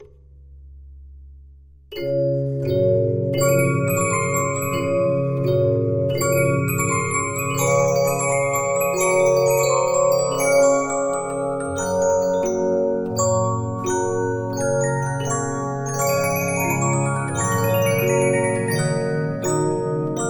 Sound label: Regina, 15inch disc musicbox